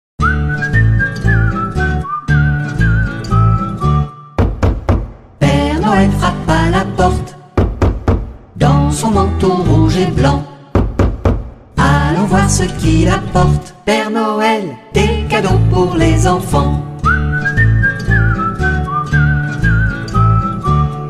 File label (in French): refrain frappe